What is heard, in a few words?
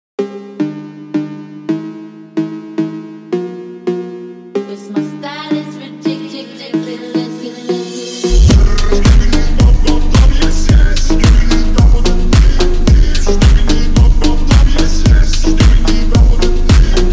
Phonk slow Reverb; Skibidi Toilet